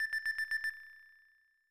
Unused Jingle; super mario excitebike

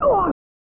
Scream of Agony

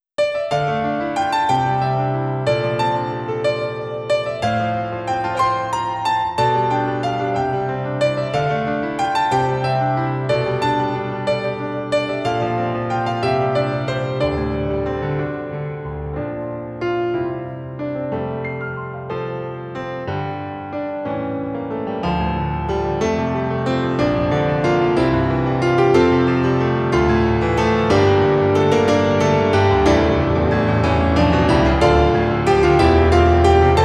Main Theme Piano; HARVESTELLA

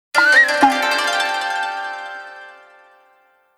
Cherry Blossom Snowstorm Jingle; Pachi-Slot Sengoku Musou

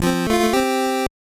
Small Victory; Robin Hood Prince of Thieves; NES

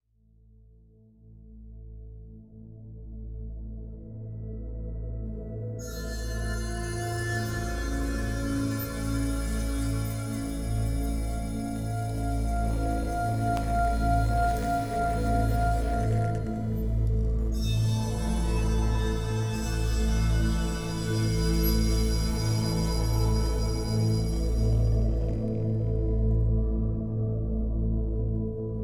Music; Sifu

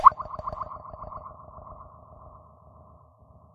Drop; And Yet It Moves

